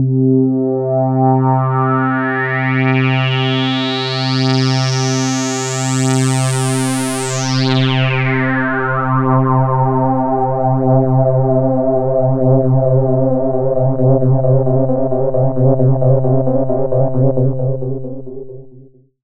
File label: PAD C3, SCI Prophet-5 synth pad; Pads